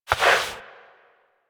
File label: Crouch Move Foley; Warframe; Tenno